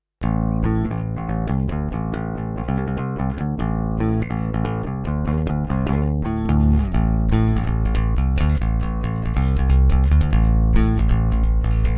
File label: BH-550 L2000 P Bass Passive Pick